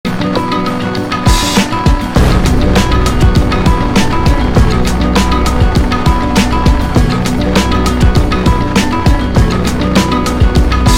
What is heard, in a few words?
echo